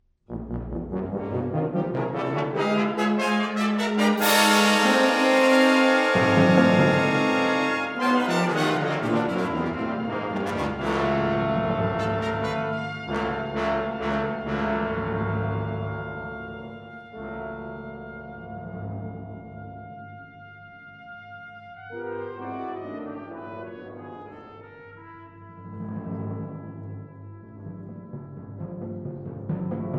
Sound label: Symphony for Brass and Percussion, Allegro; IV. Introduction